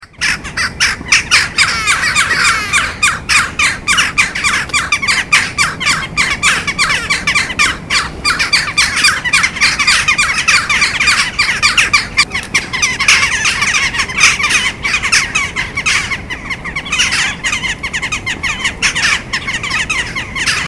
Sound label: Frog Sound of Seagulls